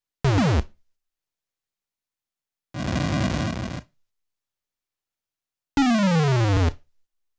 Big Gun Sound ~ Fiery Breath Sound ~ Falling Sound; super mario world live; Koopa's Fiery Breath Sound